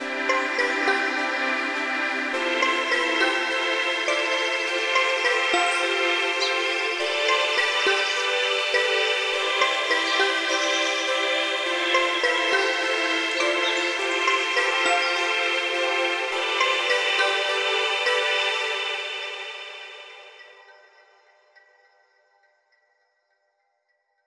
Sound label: melody C maj 103 bpm; bespoke - Floral v.1